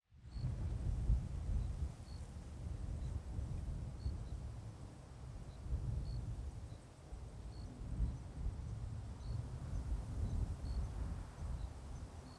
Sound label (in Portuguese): Aviao Passando com Vento em Ambiente de Mata Aberta e Grilos; maquinas; colecao sonora do cerrado